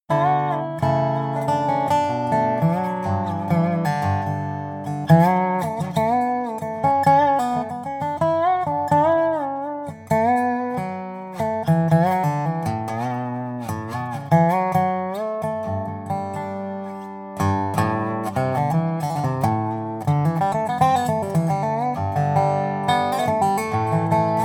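Sound Sample Rosewood Spruce, Custom Resophonic Guitars; Mistr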